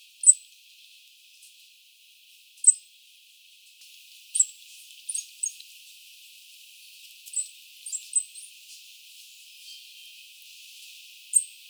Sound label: Nasua nasua